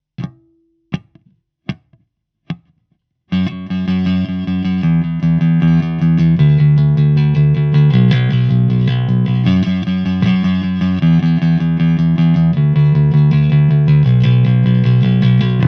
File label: Crush 25 O Bass Heavy